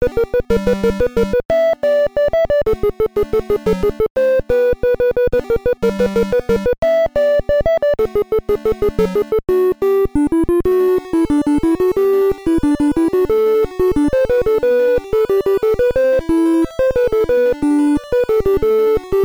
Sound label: Timer Low; House of Tako